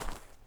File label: Footstep Snow; Among Us